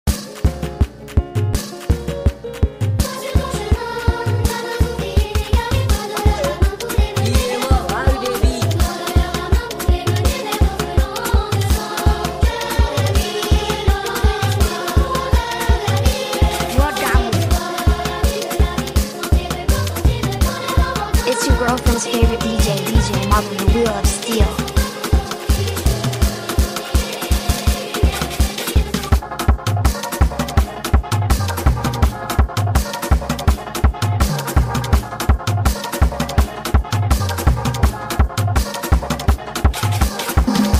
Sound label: beat